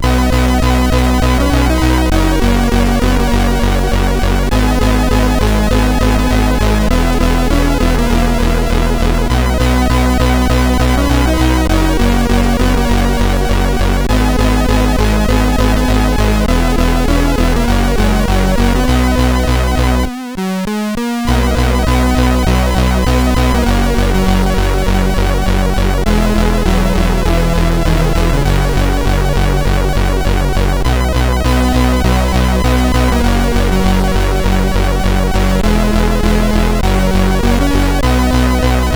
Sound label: Jumping Jack, CSG8580R5; Andy